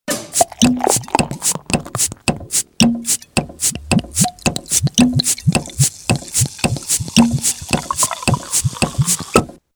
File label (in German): motorsaege geraeusch bier